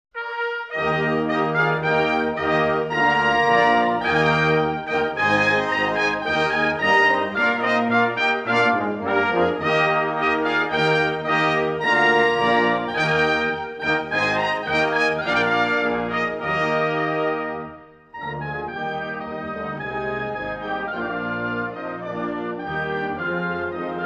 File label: Trumpet Prelude; music of the cold war in canada